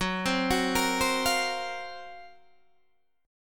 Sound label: Gb Major 7th Flat 5th Guitar Open D